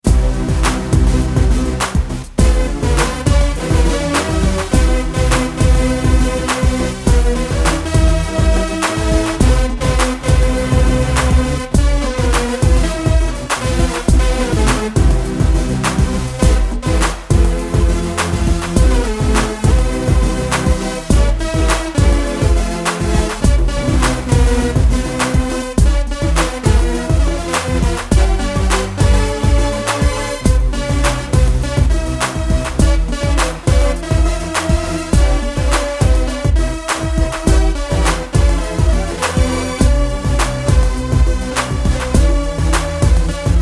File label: Hip Hop Song